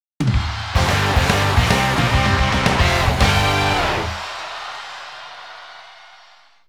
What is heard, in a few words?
WINNER